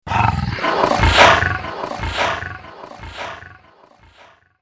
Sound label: lion roar; valve; dutch; train